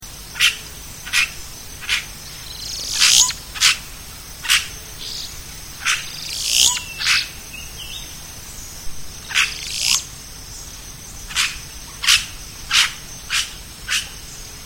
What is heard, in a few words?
Red- rumped Cacique; Boyero Cacique; Cacicus haemorrhous; cantos